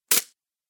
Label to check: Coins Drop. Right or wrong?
Right